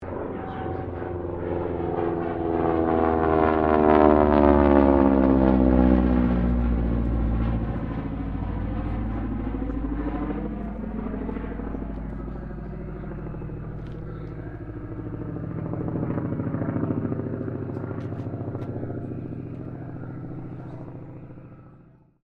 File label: AIRCRAFT AT-6D HARVARD MK III PASS; Harvard Training Aricraft; Airplanes; Vehicles